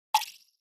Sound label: Button Hollow Wood Hi, buttons